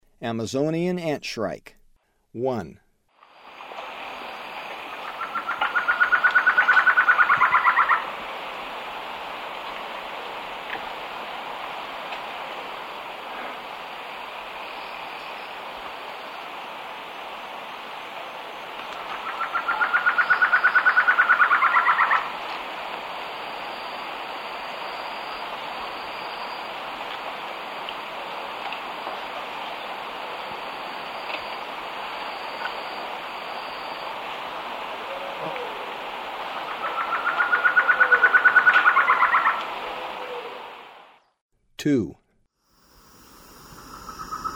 Amazonian Antshrike; S. America; Eastern Lowlands

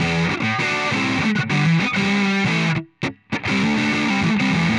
Pushing Riff, Rock Guitars

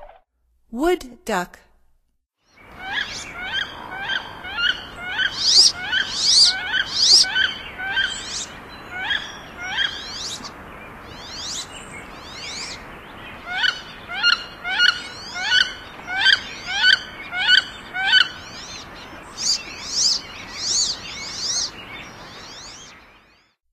Wood Duck; North America; Stokes

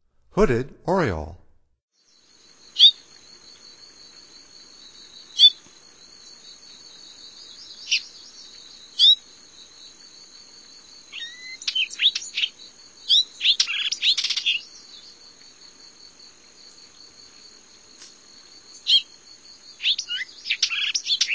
Hooded Oriole, Songs of Birds of North America; Stokes

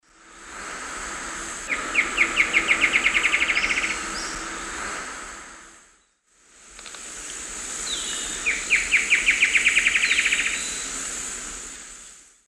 Herpsilochmus dugandi, song; S. America; Eastern Lowlands

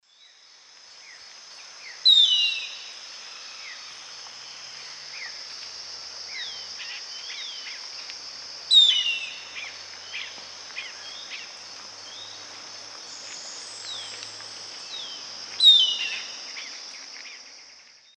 Leucopternis melanops, display calls; S. America; Eastern Lowlands

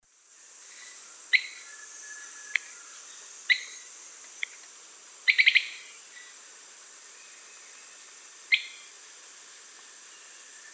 Hemitriccus zosterops, calls or song; S. America; Eastern Lowlands

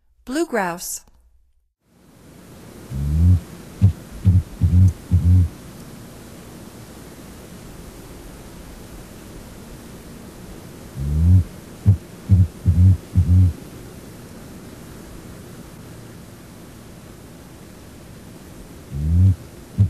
Blue Grouse; North America; Stokes

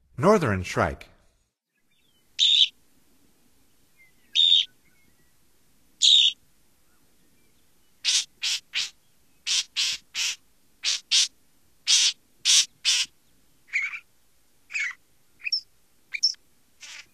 Northern Shrike, Songs of Birds of North America; Stokes

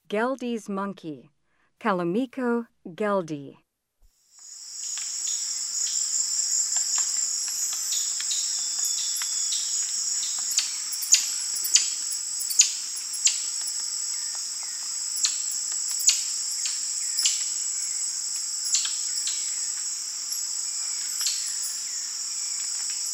Goeldi's monkey, Primates of the Rainforest; S. America

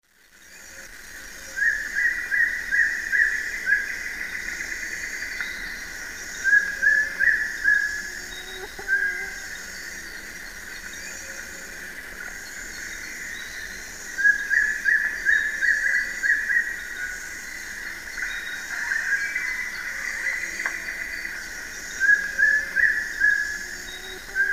Piprites chloris, song; S. America; Eastern Lowlands

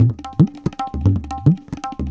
tabla funk 110

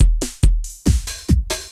techno 140

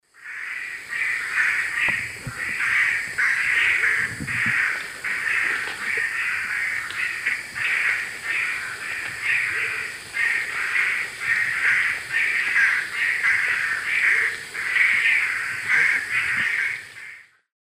Capito auratus, alarm calls pair; S. America; Eastern Lowlands

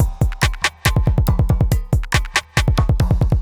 ind 140 kit

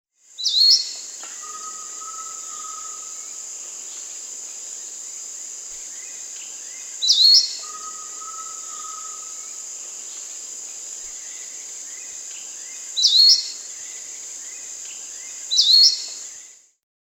Forest Elaenia (Myiopagis gaimardii); S. America

